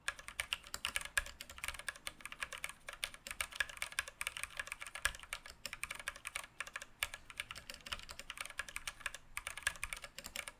cherry mx speed; speed red switches

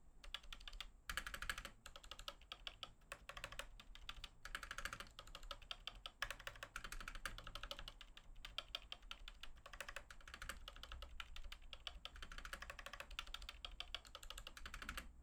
stabs; skyloong gk61

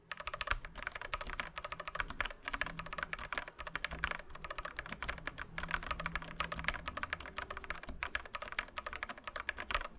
cherry silver; mechanical keyboards

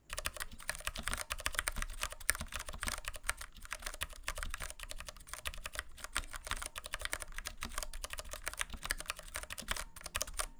cherry mx grey; mechanical keyboards

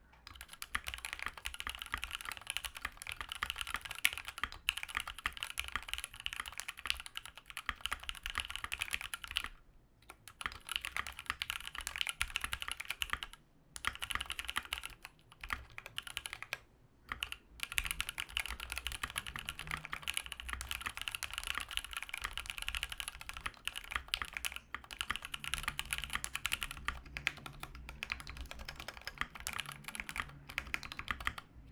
skyloong gk61 recording, mechanical keyboards

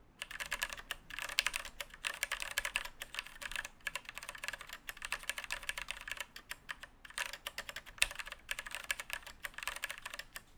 cherry mx black; black red switches

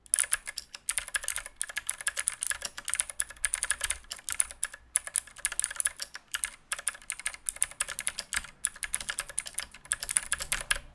mx blue

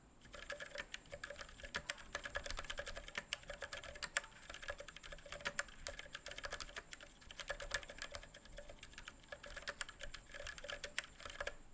mx silent red; mechanical keyboards

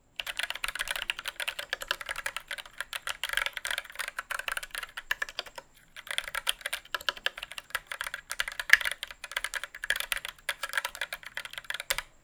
recording louder, optical; optical vs mechanical

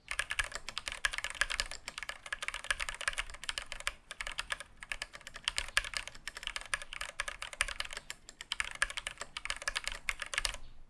gateron red; gateron vs cherry

